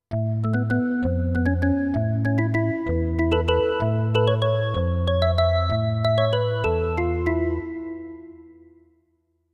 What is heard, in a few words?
Drawbar Org